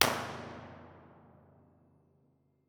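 tunnel entrance f 1 way mono, impulse response, Innocent Railway tunnel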